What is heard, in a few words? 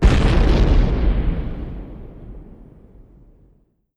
mortar expl; weapons